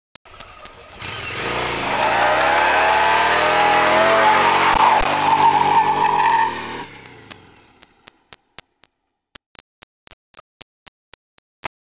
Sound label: Yamaha XVS 1100 Dragstar (Burnout)